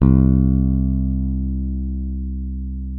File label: C2, FINGER MUTE; Xsample VOL-1